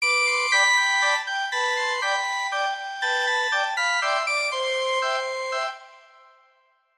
Calliope Waltz

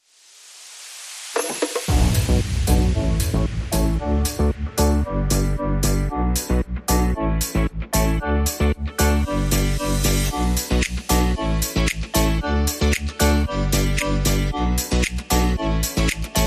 Pina Colada Chords Rhythm

